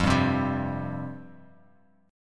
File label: F Suspended 2nd F; Fsus2 strummed; JGuitar